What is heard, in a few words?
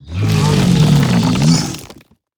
Stone Golem Boss Play